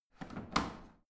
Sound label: washing machine drawer in soft